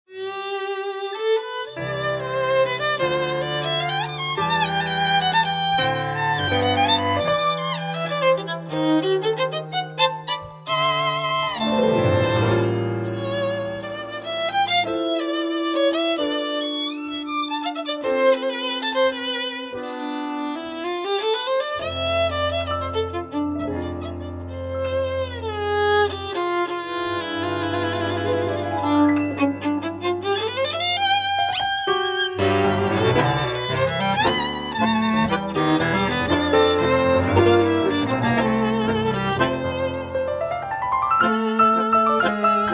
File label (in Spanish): TANGO